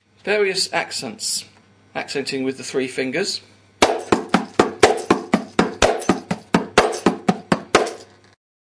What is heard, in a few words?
kanjira accent